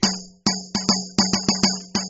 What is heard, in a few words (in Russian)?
buben